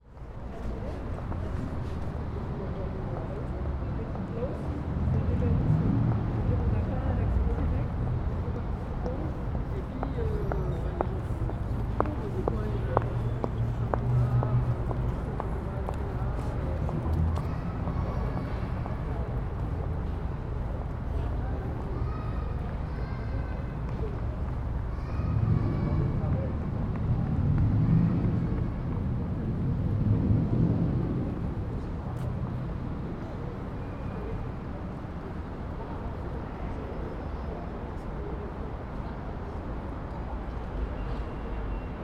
public places city lausanne switzerland 8pm quiet few people distant traffic; bell